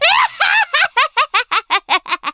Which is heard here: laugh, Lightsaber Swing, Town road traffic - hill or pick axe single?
laugh